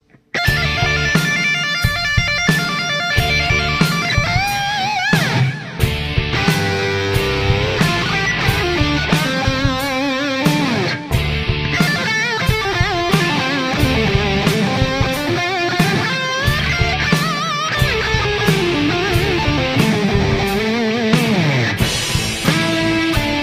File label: Metal